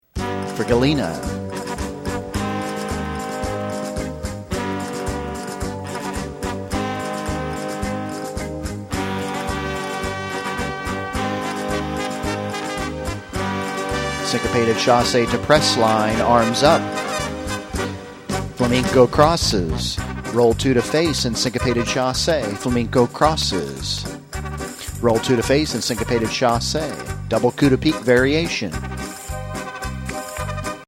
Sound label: Trumpet Fiesta Cued